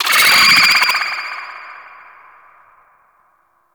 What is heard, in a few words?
Stomp Warning Vocal; Enemies; Vocals; Riot Moa; Warframe